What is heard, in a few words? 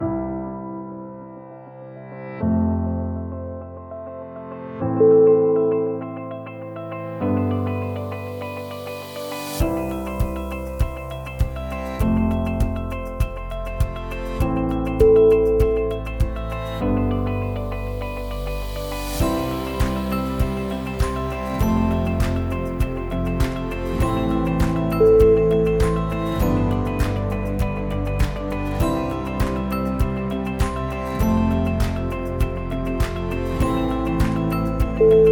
inspiring motivational corporate